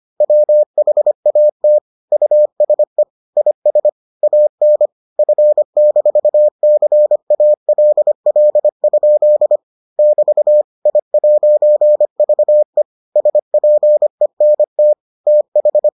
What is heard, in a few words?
morse